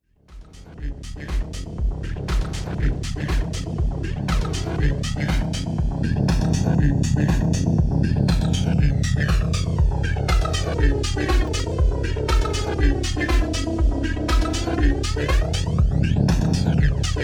Xone PX5 Flanger

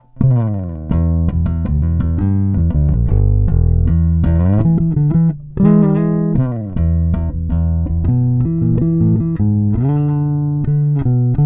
Bridge coil

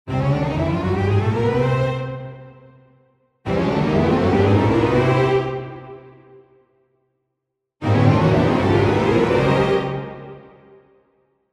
Ensemble Strings Full Mid Run up; Chris Hein

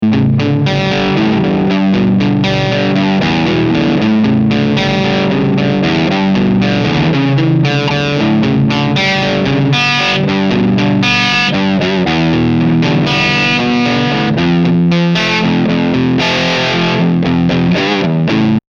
Crunch Picking

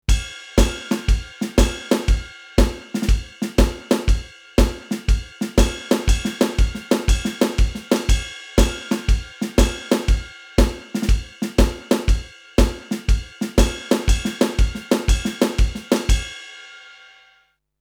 Blues

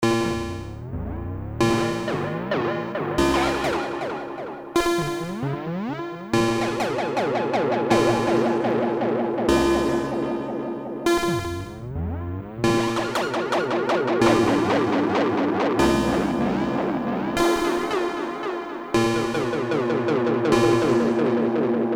Moog FX Time Feedback Modulation; T-REX Replicator Module

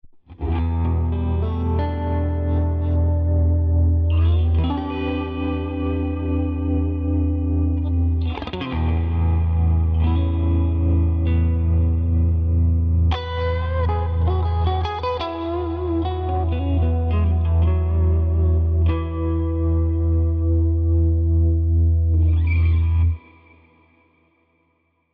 Tone King Imperial MKII Trembiance

